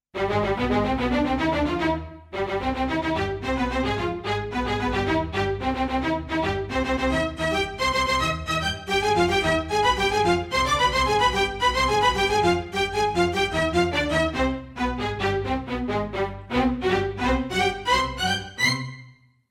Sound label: Staccato; Pa2X